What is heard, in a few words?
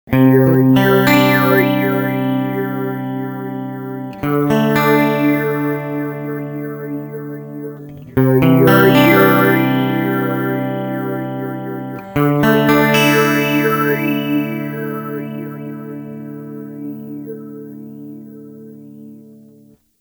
Kaoss